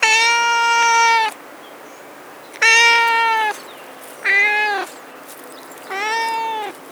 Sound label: cat meows sounds